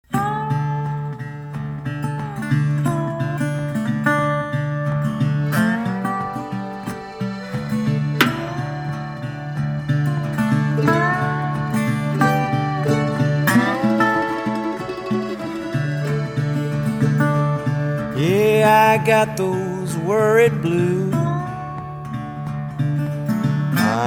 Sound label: Blues